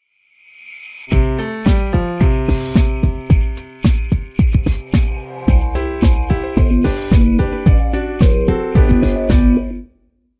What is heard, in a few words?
Jingle Bell Jam